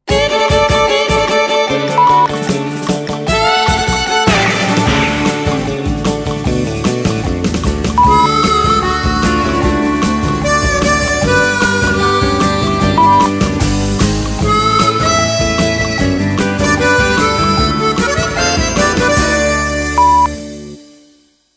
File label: Cowboys Big World Fiddle 151bpm